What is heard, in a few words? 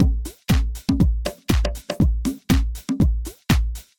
House Latin Beat